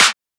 Clap, Techno; Techno Sounds 2024

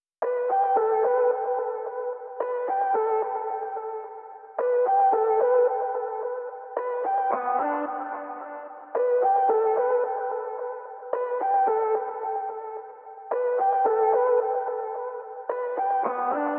110 BPM E Min Lead; Cymatics

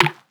Percussion, Hip Hop, Drum One Shots

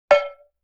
Cowbell Mid Damp; Vintage Cartoon; Drums & Percussion

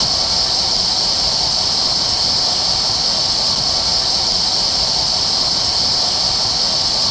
Texture Loop, Techno

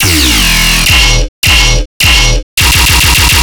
Bass Loop D# 140BPM, Dubstep; Grim Reaper's Anthem

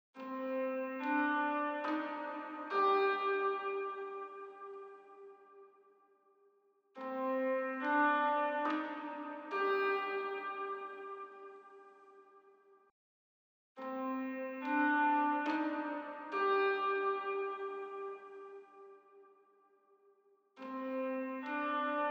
141 BPM C Min Piano Lead; Cymatics; Red Eyes; 2022 Melody Collection; Drill

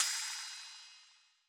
Drum Loop - 65 BPM Cymbal; Loop Stems; Cymatics; DOPE DRUMS VOL. 1; Peach

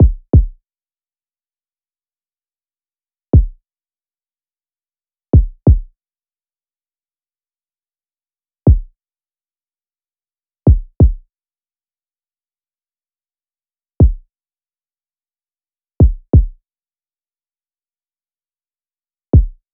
Drum Loop - 90 BPM Kick, RnB; Distraught; Cymatics; ANALOG EVOLUTION Beta

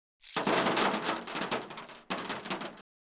Coins Dropped on Metal Table; Fairlight Sound Lib

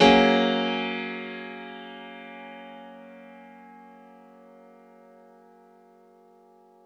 One Shot Piano - 140 BPM (F# add2), Grand Piano; Instruments; One Shots; Ghosthack; Guitar And Piano Samples

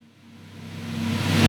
Reverse Impact; House; FX; Rampage Reverse Impact; Reverse Impacts

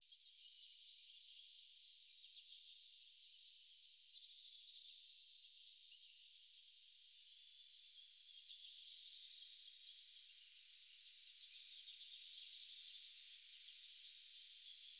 128 BPM A Min Ambience; Loop Stems; Cymatics; Infinity; Breakdown Loops

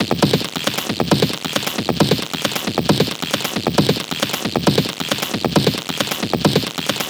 Perc Loop 135, Techno

